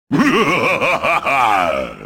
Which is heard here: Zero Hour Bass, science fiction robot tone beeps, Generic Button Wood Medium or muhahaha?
muhahaha